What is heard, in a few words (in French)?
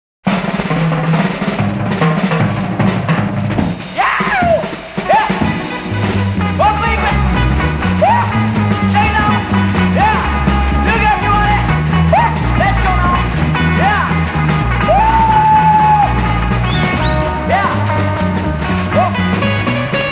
instrumental